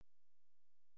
silence; qdvdauthor-0.1.5

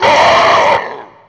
taunt; scourge; area51

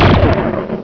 boom

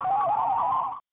bonus

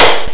explosion; madbomber-0.2.5

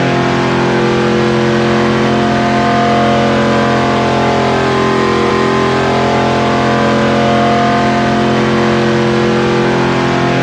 engine, cars; vdrift; G4

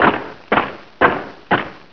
leg; lgeneral

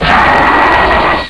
pain, bug; scourge

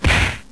hit; blobAndConquer; combat

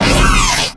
fall; scourge; arachnatron

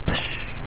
miss; seabattle-1.0

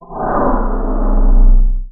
poison, magic; scourge

